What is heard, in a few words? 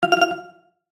Multimedia Positive, Technology, Multimedia, Positive, Element; Alert; Alerts